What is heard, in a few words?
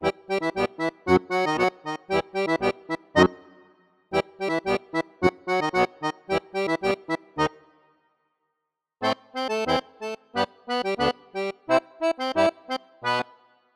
Accordion Loop